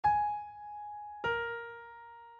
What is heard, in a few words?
down